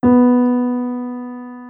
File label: clic